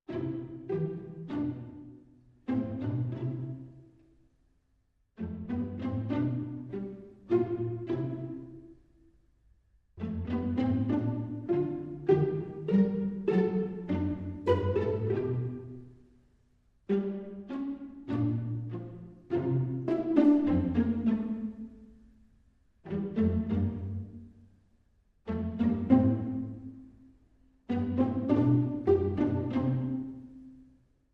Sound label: Strings Only